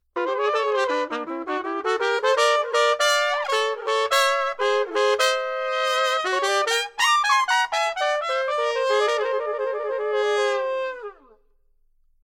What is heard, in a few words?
Trumpet Duo